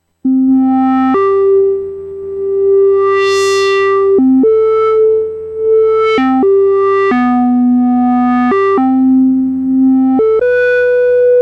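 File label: hi square filter sweep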